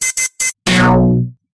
Wrong Answer

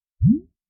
Wobble Wood

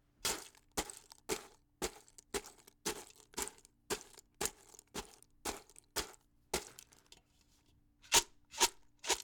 March Of Military

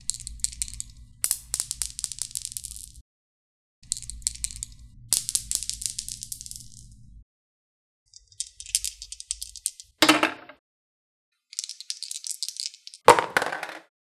Dice Roll

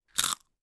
Chewing Breadstick Single

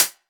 80s Hat

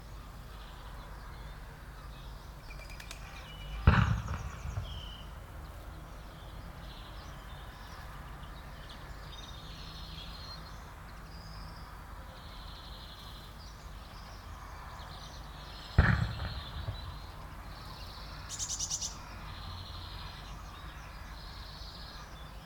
Pigeon shooting

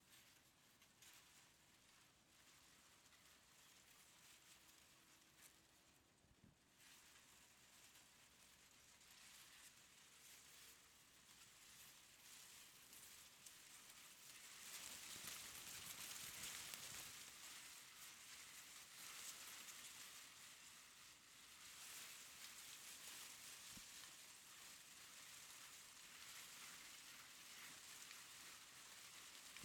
Leaves Rustling From Wind Or A Person

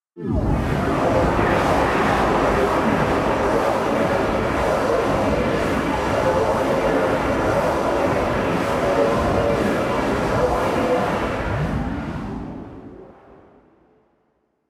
Dark Spell Cast Loop

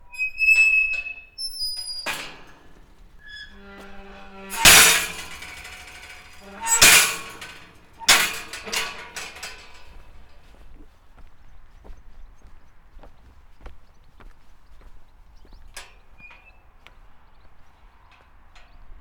Creaky Squeaky Metal Gate

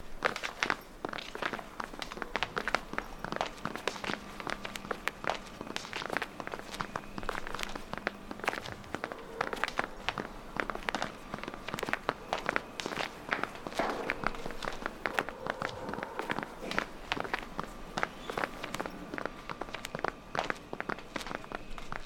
Group Walking Group Footsteps

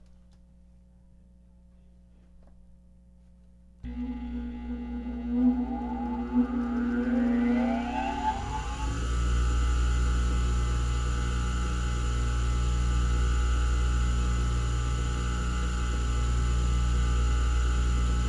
Grinder

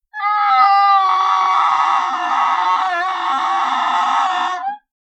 Sound Alien

